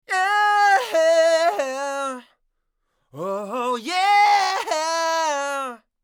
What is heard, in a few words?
Belting far